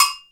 AGOGO, PERCUSSION; Crypton - DrumBase5000

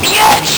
LSDj, Nintendo Gameboy; 300 Drum Machines; Ghetto

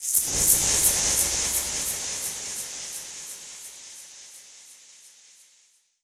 Perc Hit, dub percussion; musicradar

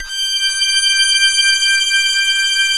G5 string; NorthStar - The Wizard VOL-2; STRING THING

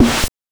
BANK1 SD, NES DPCM; MIDINES

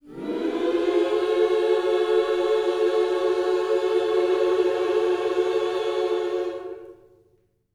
MINOR, MIN.SCOOPS, Vocal Planet, Gospel

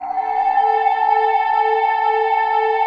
AMBI PAD

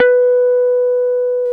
Jazz Guitar; 90's Sample CDs; Club-50 - Foundations Roland; Jaz-Kessel

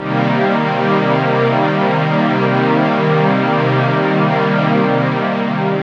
Jacobs Ladder Bb, Pads; Zero-G; Total Drum Bass

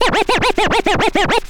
scratch short, Vinyl scratches

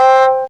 Vln Lo C5, HiString

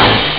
hihat open; ST-XX Amiga Soundtracker Sample Packs

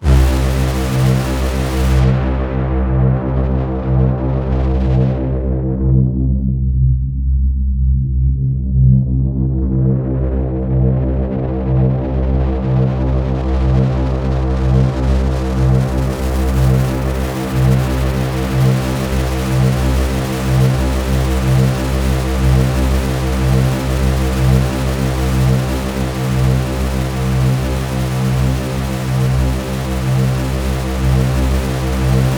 C1, Synth Line; 90 s Sample CDs; NiceBeats; SynthLine VOL-16

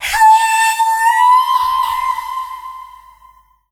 FEMALE, Dance Vocals

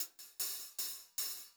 POP HH, Pop-Rock Drumloops; 152 POP

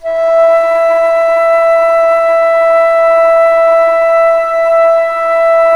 ALTO E4, ALTO FLUTE; Historical Instruments; AKAI; USB Soundscan vol.23

